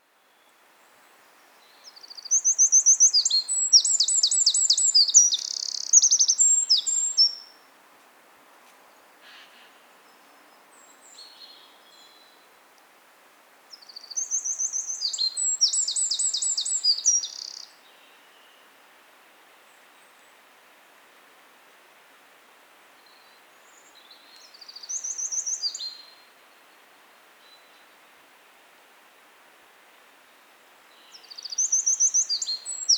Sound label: Troglodytes troglodytes; SETE